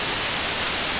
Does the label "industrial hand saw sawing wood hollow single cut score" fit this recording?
No